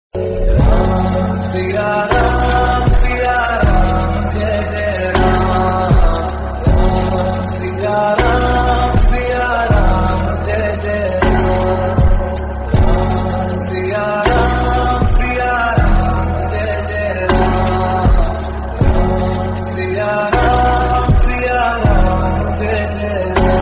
Ringtone

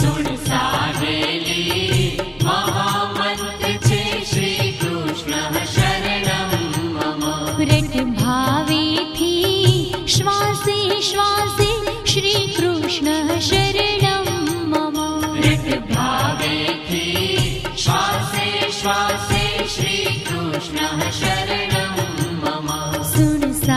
Ringtone

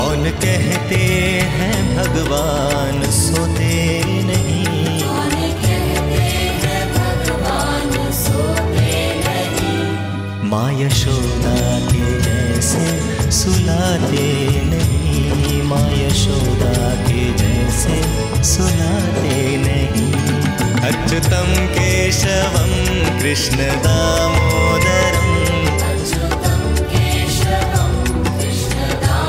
Ringtone

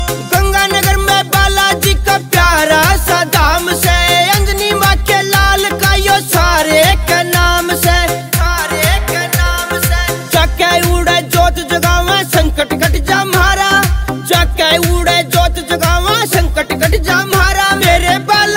Dj Remix Ringtone; Bala ji

